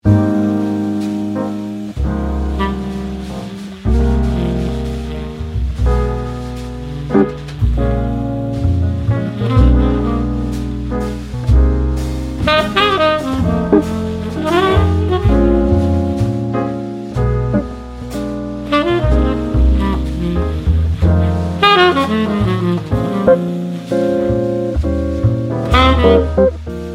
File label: Softly Jazz sax